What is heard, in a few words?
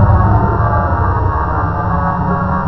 ship thrumming